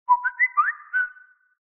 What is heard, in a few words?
whatsapp chat